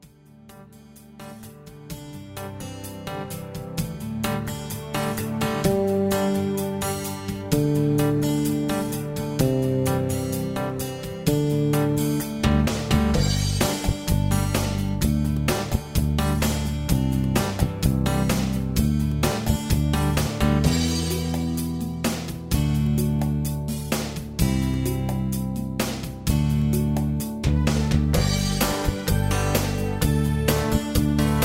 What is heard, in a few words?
Instrumental